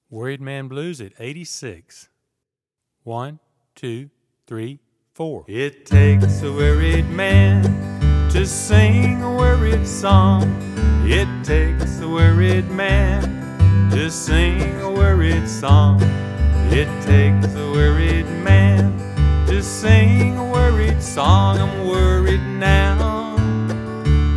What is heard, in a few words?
Blues 86 Bpm; Worried Man Blues